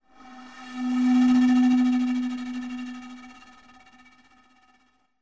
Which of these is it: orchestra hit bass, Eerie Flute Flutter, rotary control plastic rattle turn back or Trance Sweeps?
Eerie Flute Flutter